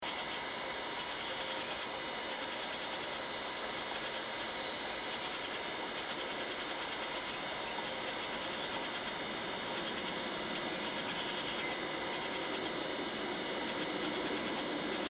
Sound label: chirpy grey bird